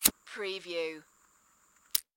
Lighter Flick Flame; Sound Effects Bible

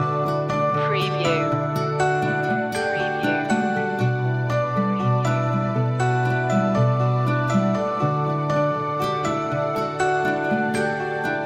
summer adventures loop

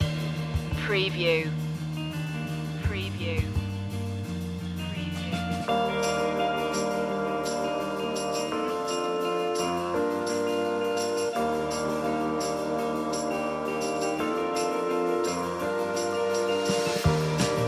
summer ride loop

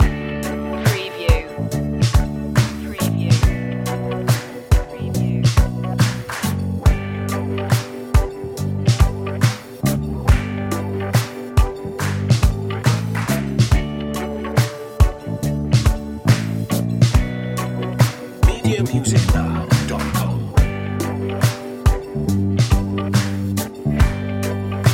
smooth groove loop

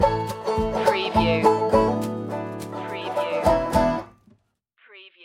country stinger

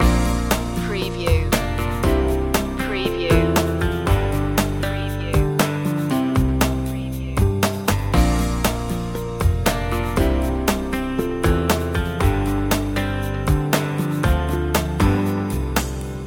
loop, chill

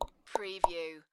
Tongue click; beanstalk audio

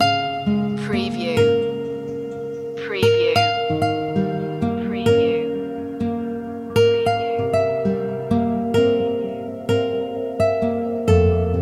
guitar blue loop

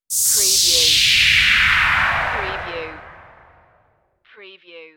Whoosh

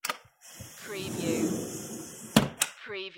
Aluminium Window Slide and Close

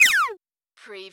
CARTOON ZIP

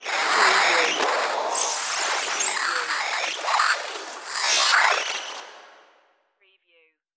alien bug flight

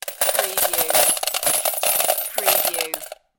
Slot Machine Win - Coins Falling Into Payout Slot

